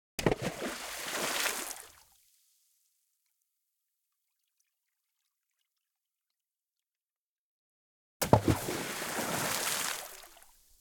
Glacial Lake - Large Size Rock Splashes - 5 Meters. Water, Splash, Large, Rock, Impact, Various; Mattia Cellotto; Rocks Momentum